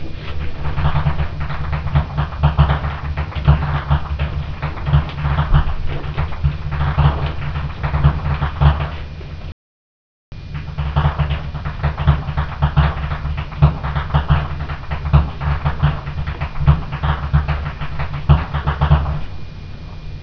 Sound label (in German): schnalzen, jugend; Bergheim